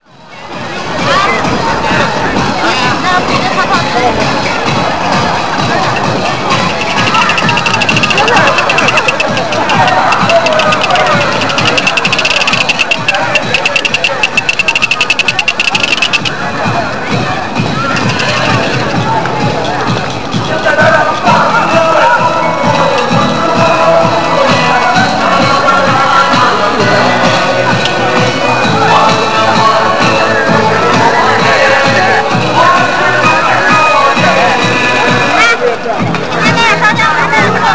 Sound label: ditan sounds; china